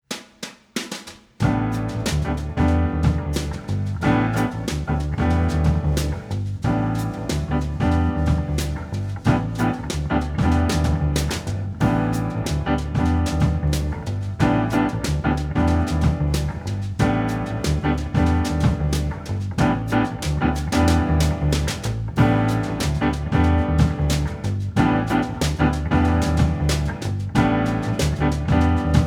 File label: Trio Arrange; pp pianissimo ayatsuri ningyou no rinbu original sound track tonality